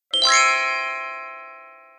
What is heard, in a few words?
score Blast; Temple Run